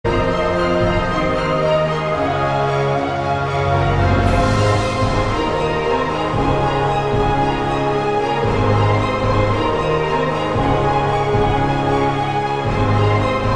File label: Orchestral